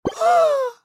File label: Scream; Kitty Shorts Simulator; Android, iOS